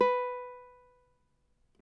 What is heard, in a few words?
CAVAQUINHO B, Guitars; Spectrasonics; Hans Zimmer Guitars VOL-2